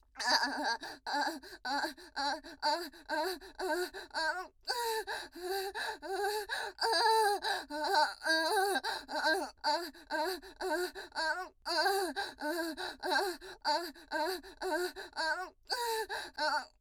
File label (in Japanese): 喘ぎ声